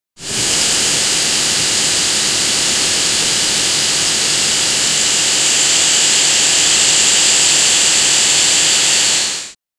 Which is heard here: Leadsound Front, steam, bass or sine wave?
steam